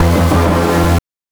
BANK2 SFX, NES DPCM; MIDINES

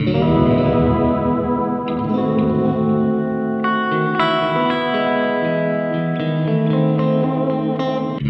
guitar, stringed instruments

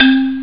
menu choose; ST-XX Amiga Soundtracker Sample Packs

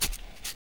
VOC PERC, Vocal Planet Jazz FX BASS PERC

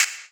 Claps, Elektron MachineDrum; SPS1 MKII

